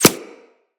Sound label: Arrow Impact; Weapons; Bullet Impacts